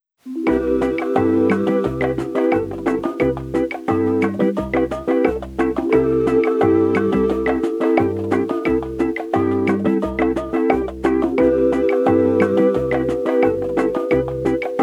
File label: Lounge Music; Spiritfarer